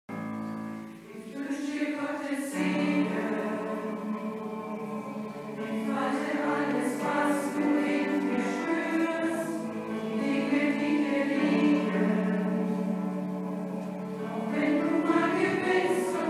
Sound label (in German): Kirchenchor; Konfirmation